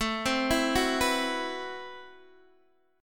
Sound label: A Minor 6th Add 9th A Guitar DADGAD